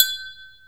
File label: knocking glass - IDFT(abs(DFT(sample))) post-processed by SRFO